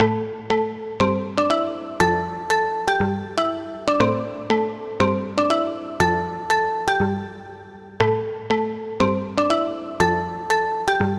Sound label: Bell; New Journey; Routed Sound; Vital Expansion - Story Walker Vol.1